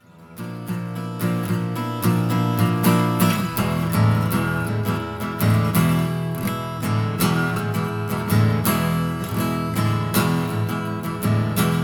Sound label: Acoustic; Audio Envy Studio Elite